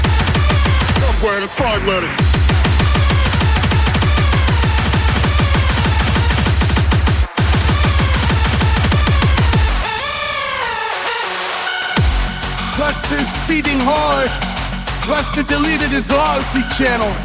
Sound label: HARDCORE RAVE